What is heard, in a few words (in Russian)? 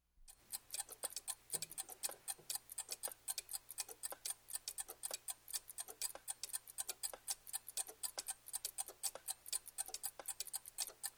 ТИКАНЬЕ НЕСКОЛЬКИХ КАМИННЫХ ЧАСОВ